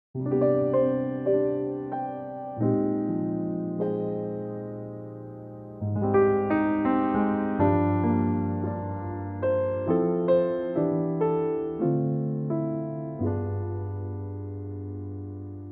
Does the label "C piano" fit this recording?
Yes